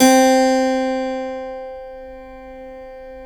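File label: C3 clavinet right, Keyboards Acoustic; USB Soundscan vol.17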